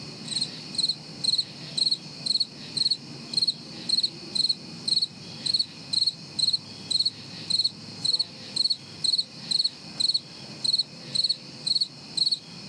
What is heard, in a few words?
BGM Cricket Loop; Trickcal Chibi Go; android